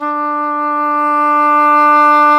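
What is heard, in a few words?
WND English Horn, Swell; Roland LCDP04 Orchestral Winds; Eng Horn Swl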